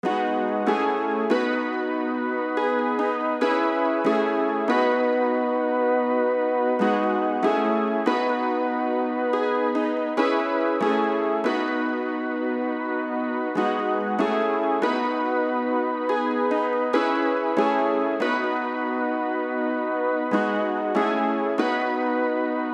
Melody Loop Cmin 142 BPM Keys

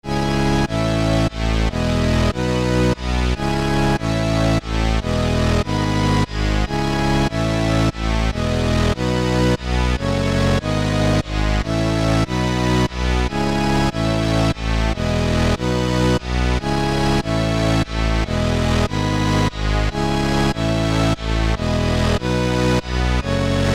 Serum Synth

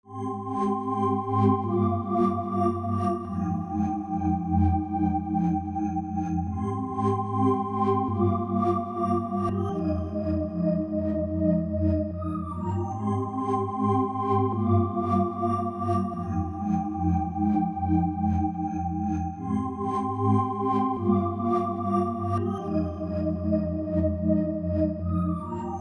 Serum Pad

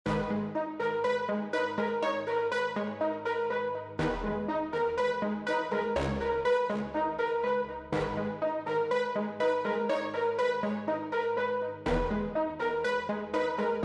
G min 122 BPM Brass Synth

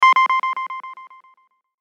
Pluck One Shot

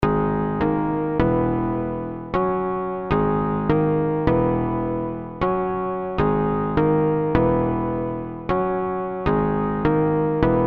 Melody Loop Gmin 156 BPM Key

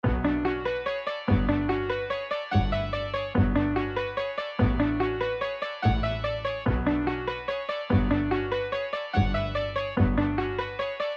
B min 145 BPM Synth Keys

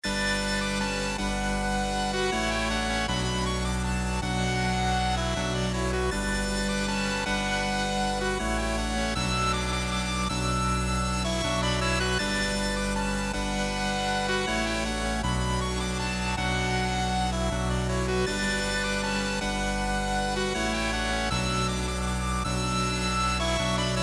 B min 158 BPM Synth

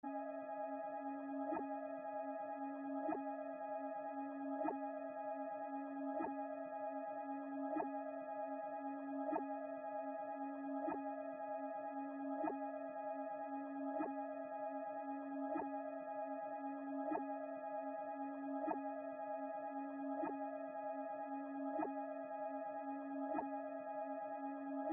Melody Loop Cmin 154 BPM Pad

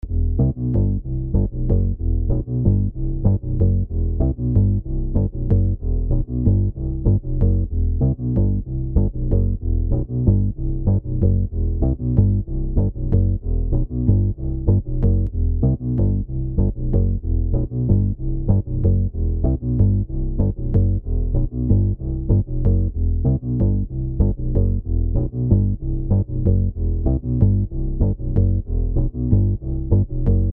Latin Melody Loop Emin 126 BPM Bass

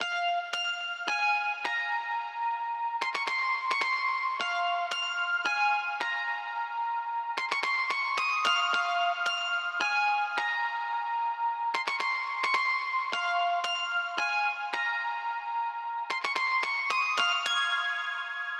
Afro Melody Loop Amin 110 BPM Guitar

